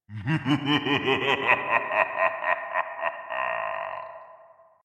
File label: evil laugh